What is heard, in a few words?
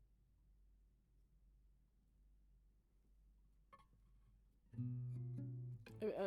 GTR